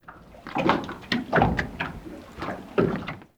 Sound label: Water Lap Creak Under Dock Slow Deep; Water Laps